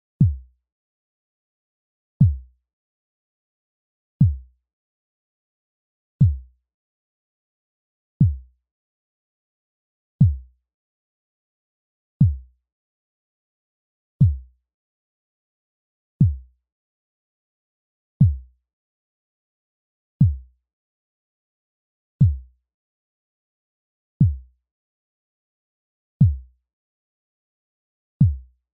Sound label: bassdrum 909